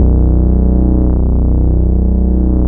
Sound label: BASS, Bass Analog & Synth; STRICTLY; USB Soundscan vol.12; AKAI